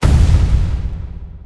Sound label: bloody murderer die